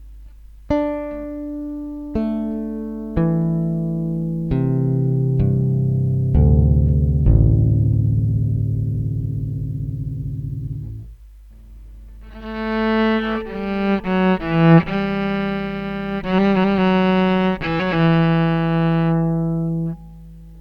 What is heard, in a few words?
sample gamba to preamp, violin